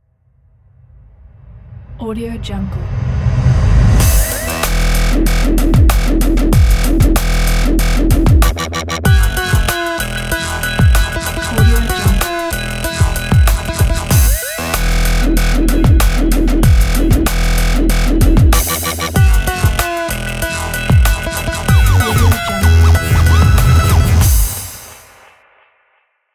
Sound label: extreme beat; r mafi